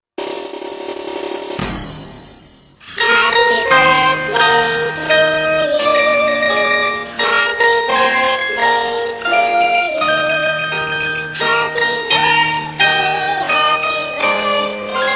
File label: Another Happy Birthday Song